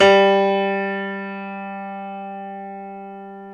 PNO, Grand Pno, Roland; Club-50 - Foundations Roland

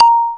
A#, Synclav FM PERCUSSION; Big Fish Audio

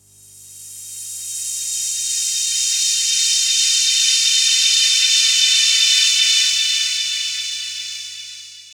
Pads A; Zero-G; Total Drum Bass

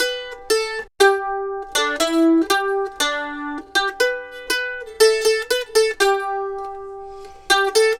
Mandolin Lead; Continental Drift Aftershocks

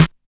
SD PSS50, digital; 90 s Sample CDs; Sound & Vision - Gigapack I CD 1 (Roland)

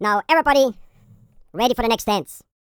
ENGLISH, YODEL YELL, World Voices; ILIO; Vocal Planet

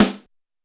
Roland U220 Dry Set

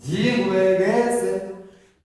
"TEE-LOWEA", MALE; Spectrasonics; Heart of Africa 2; S.MALEAMB

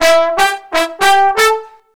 Disco Fever, BRASS-LICKS; Best Service ProSamples vol.48 - Disco Fever; AKAI

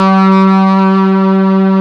SUB SY G3, SYNTHESIZER; AKAI S6000 CD-ROM - Volume 1; GLUNGE

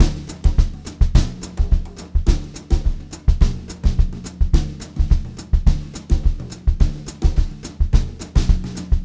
HOTROD VARI, Brushed Drum Loops

